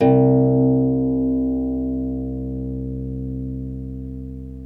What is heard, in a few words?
Troubador Harp